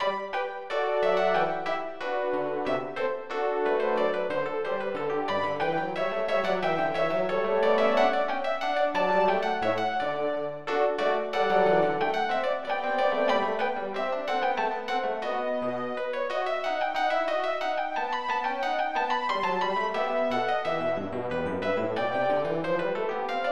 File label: gavotte